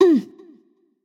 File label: notice; smite; voicepack; medusa